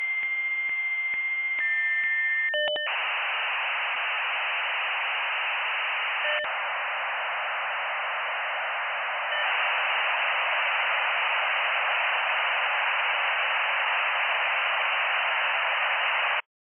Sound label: old dial up sound; 1984